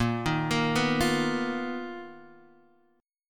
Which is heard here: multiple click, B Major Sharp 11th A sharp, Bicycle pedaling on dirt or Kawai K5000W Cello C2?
B Major Sharp 11th A sharp